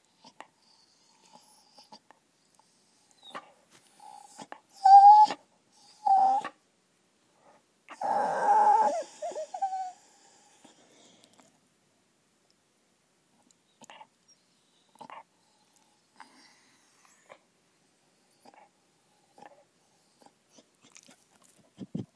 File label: weird bear noises